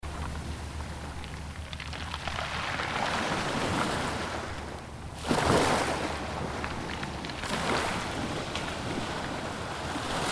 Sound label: ocean waves